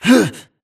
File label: grunt hits; Titanfall 2